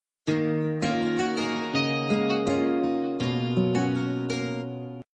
soft bell msg tone

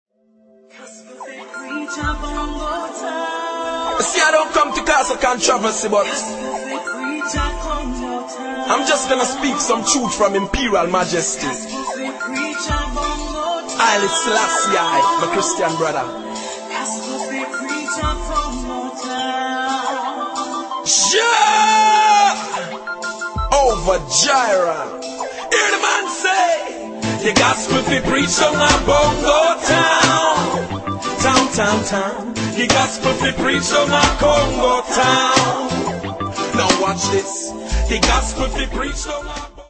bongo

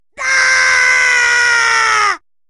daaah

